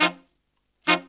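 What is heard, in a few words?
Pop & Funk Brass, 110 F, right; Best Service ProSamples vol.25; AKAI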